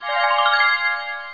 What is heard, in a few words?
correct; Monster Media Number 15